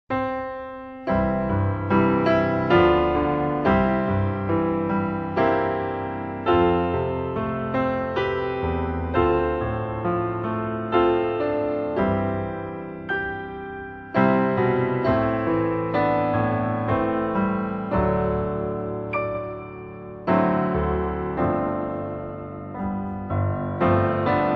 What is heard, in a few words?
Keyboard